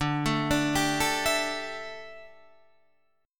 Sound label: D Suspended 2nd Suspended 4th D, down, Guitar Standard; arpeggiated